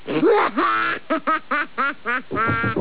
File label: laugh; bart; simpsons